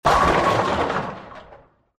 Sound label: bowling impact